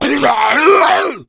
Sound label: hunter pain; player; voice